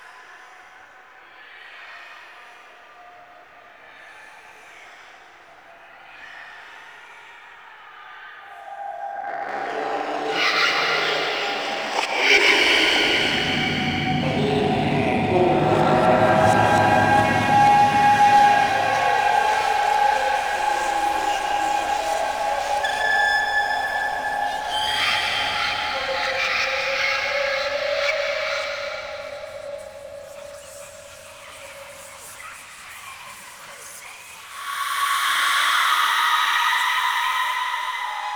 Resource Pickup Loop; Warframe; Abyss Of Dagath

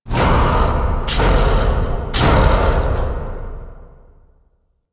Start Wave; Warframe; Entrati; Mirror Defense

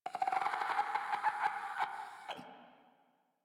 Infested Runner Patrol; Warframe; Runners

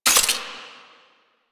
Sniper Zoom In; DE; Warframe; Frumentarius; Exalted Sniper

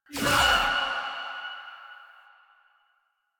Choir Erupt Proj Throw; Warframe

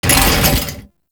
Bodyfall

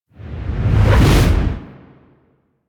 Fall Whoosh; Foley; Warframe; Tenno

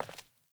Sandals Walk Default, Footsteps; Characters; Player

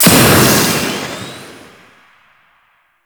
Forward Transition; Warframe; Vehicles; Archwing Demolition

